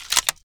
sniper railgun bolt back; tf2

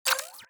step; ERRORHEAD

